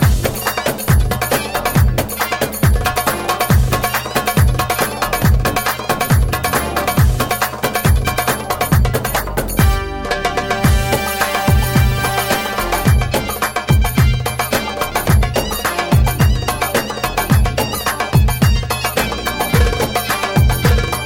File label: Darbuka